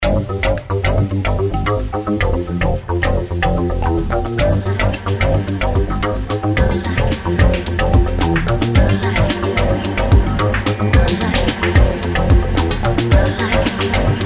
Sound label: Electronic Malfunction